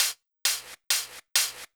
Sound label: 133 Ragga & Dub Reggae HH; USB Soundscan vol.27; AKAI